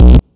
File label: bass stab